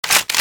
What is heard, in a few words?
m95 bolt pull